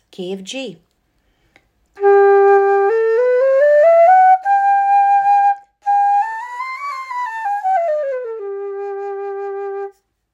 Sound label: Key of G, bamboo flutes